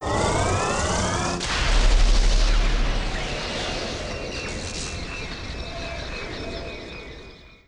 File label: Translocation